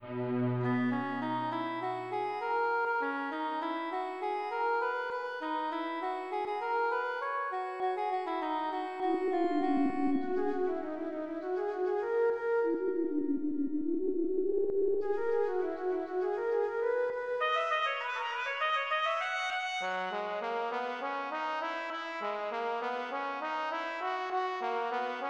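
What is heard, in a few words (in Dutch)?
1e soprano, koor; J.Kuhnau; 12.Sicut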